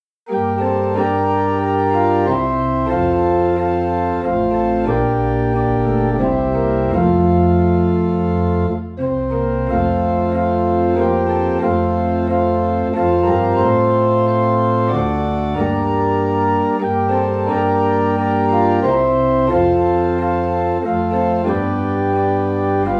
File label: Organ in F; christmas; Away in a Manger (Alternate)